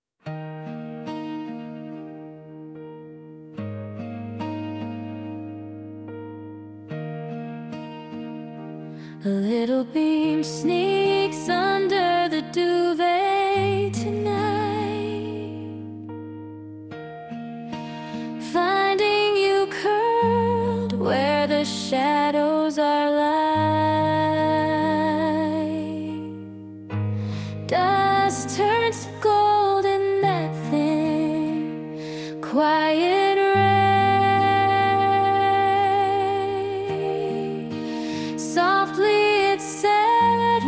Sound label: lullaby, name songs; ciara